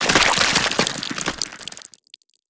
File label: vomit splash, barnacle creatures; Half Life Alyx